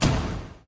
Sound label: tone; Fourth; seasonal